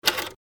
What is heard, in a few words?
mechanical switch